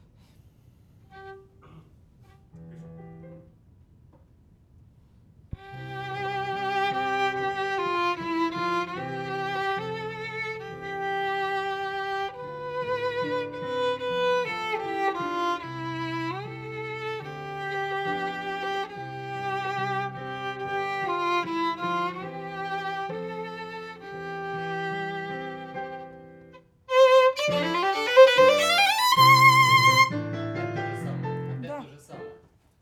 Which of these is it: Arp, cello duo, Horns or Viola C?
Viola C